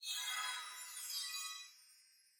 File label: medium Win Flash; cashomatic